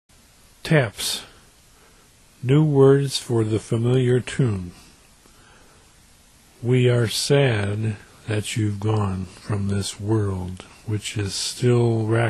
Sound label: taps